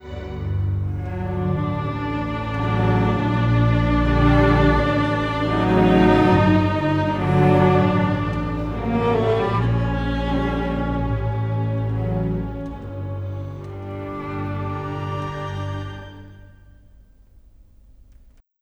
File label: Cinematic Strings, Orchestral